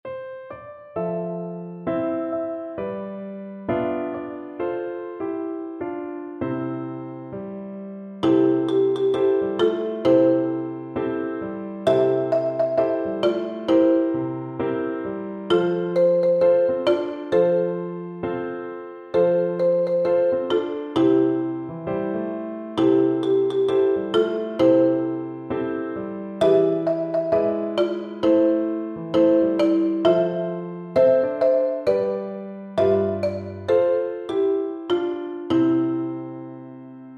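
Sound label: marimba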